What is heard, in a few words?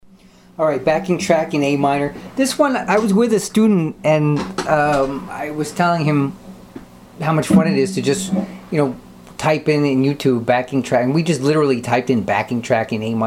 am backing track